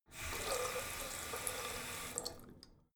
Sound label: tap flow light short, badezimmer